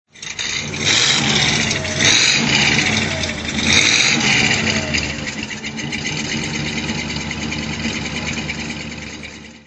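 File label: cadillac throttle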